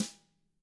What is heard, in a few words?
DW BR, DW BRSNR; ILIO; Double Platinum Drums 1